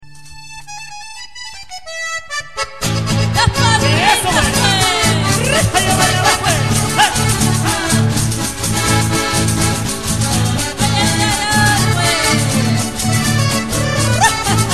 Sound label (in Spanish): cueca la guitarra